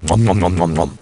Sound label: nomnom